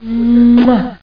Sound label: SMOOCH